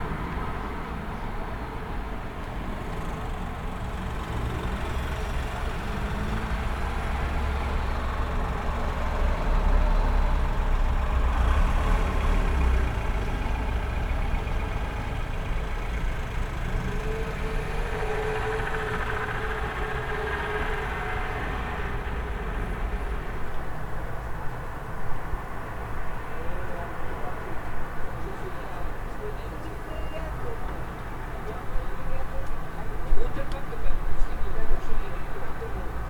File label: City Ambiance